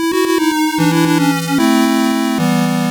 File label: Death; Jurassic Park; Master System